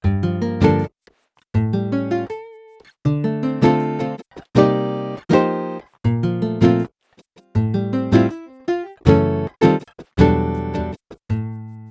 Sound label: guitar test